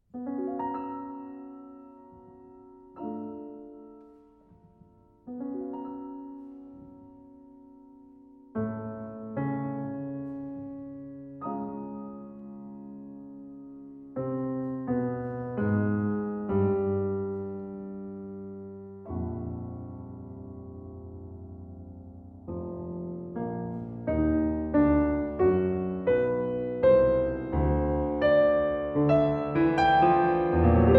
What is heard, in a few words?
piano sonata nocturne; malcontento